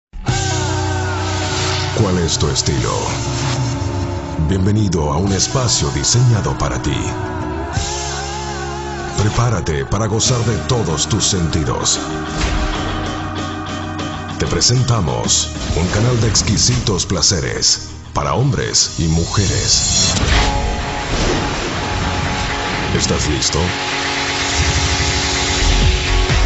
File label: ringtone